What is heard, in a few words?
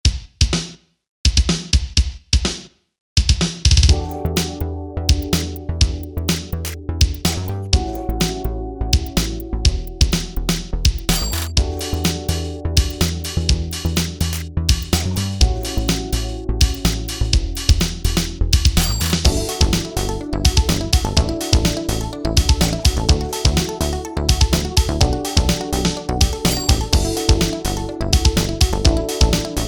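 instrumental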